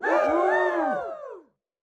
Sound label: audience chears variation